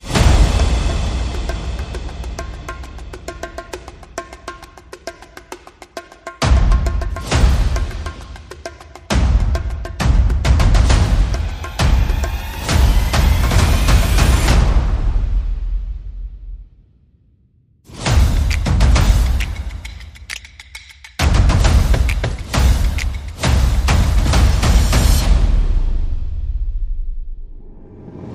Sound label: Percussion and Effects